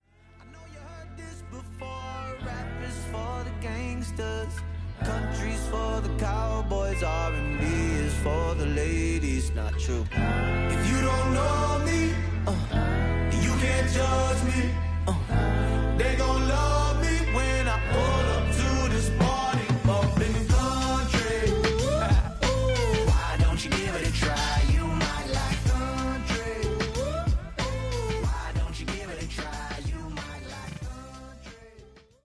break beat no trap version

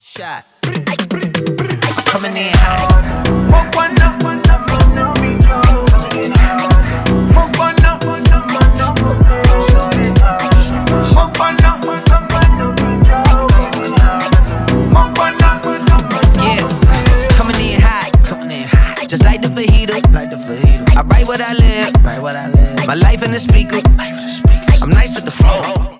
afrobeat remix

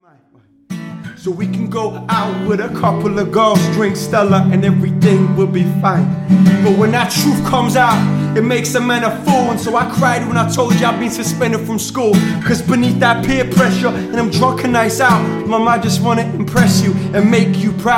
carry me acoustic

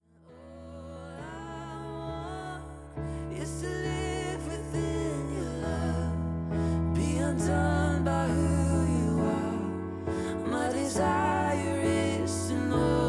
(live acoustic)

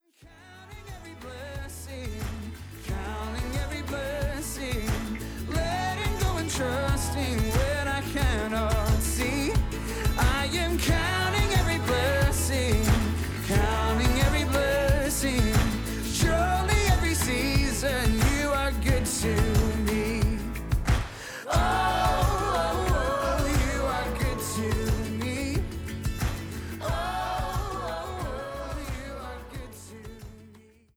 ukulele session

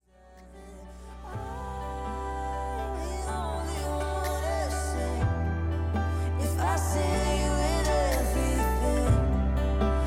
only wanna sing (acoustic)

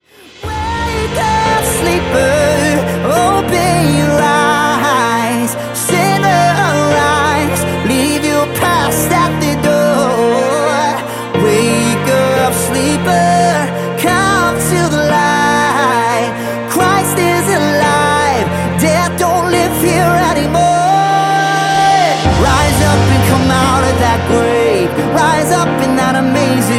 (piano version)

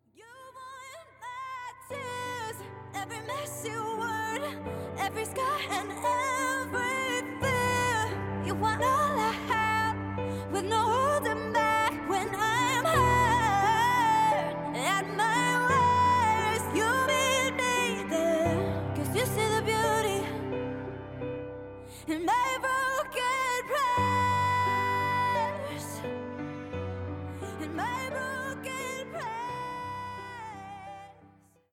broken prayers (piano version)

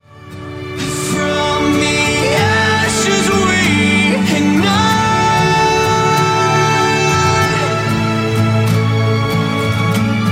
acoustic version

